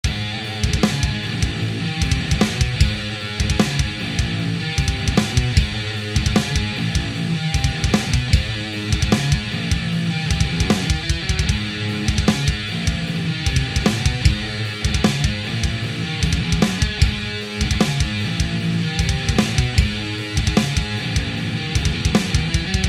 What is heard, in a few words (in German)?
Schleife; coffetribe